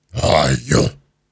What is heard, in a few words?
Grunts and Chants, Viking Vocal